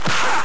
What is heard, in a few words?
ik punch; ikplus